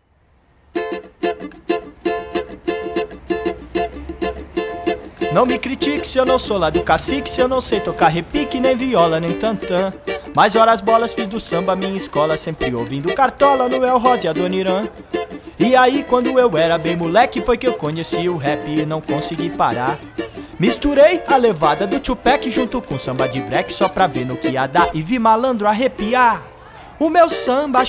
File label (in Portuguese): samba de rap